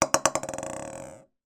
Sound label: foley bauble decoration christmas down bounce fast table; zapsplat